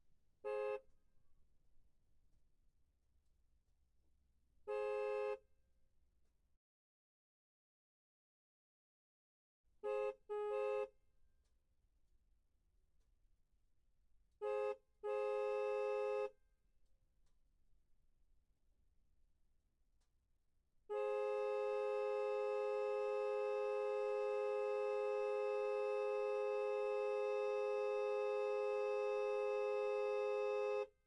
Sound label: Mercedes C63 horn various; holophone; sonniss gdc